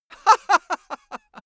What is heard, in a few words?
male laugh bright